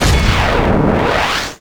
Shield Battery

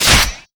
Spear cloth, Damage

